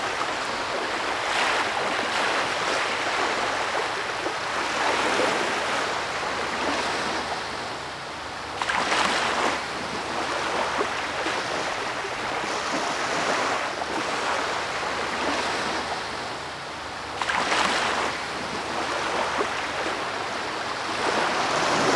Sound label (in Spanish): VADEO AGUA HIGH; SIMUZENSOUND